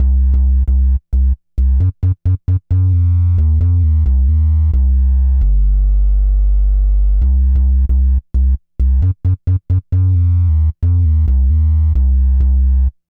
Bass, Breakbeat

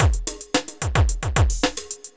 evil beats 110

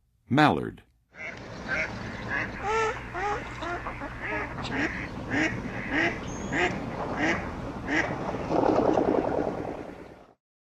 Mallard; North America; Western USA; Western USA Songbirds

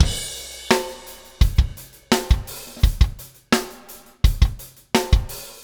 Metal Groove Beat, Rock Drums

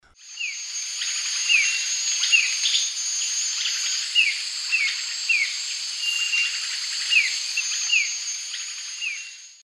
Odontophorus gujanensis, calls of chicks; S. America; Eastern Lowlands

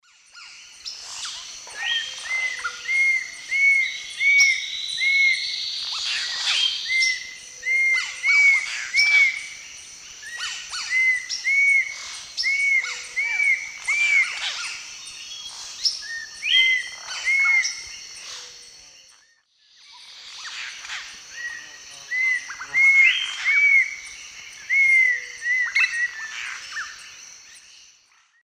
Attila cinnamomeus, song; S. America; Eastern Lowlands

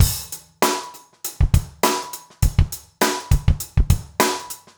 basic funk 100

